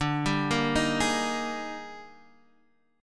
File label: Eb Major 11th D